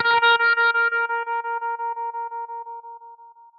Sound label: class menu, music; Garry's Mod; tf2